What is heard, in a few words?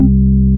DM ORG1, Dance Mega Synth